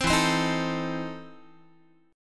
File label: E Major 7th Suspended 4th Sharp 5th, Guitar